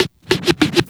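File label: scratch, record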